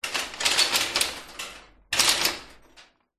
industrial chain medium movement scrape hoist over metal light room reverb